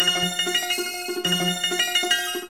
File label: noloop, SNES; Revolution X; The Elevator